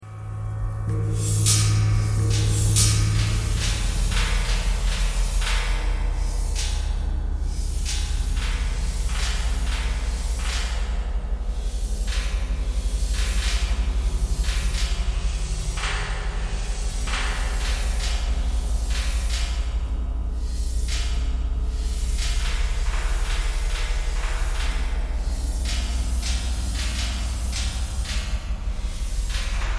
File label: knocking thread noise all